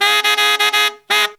160 BPM, Horns; 90 s Sample CDs; Zero-G; Phantom Horns

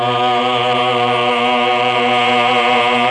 CHOR, M400; ILIO; Propeller Island Legendary M400; CHOR+OBOE

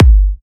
G, Kicks; markus hakala

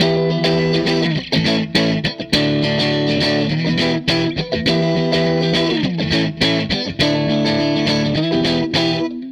Strat Guitar Loop

